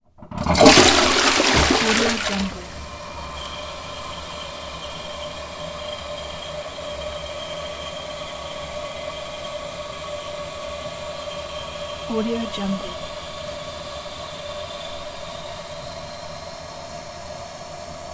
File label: toilet flush; yevhen-lokhmatov